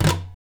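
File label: scrape, guitars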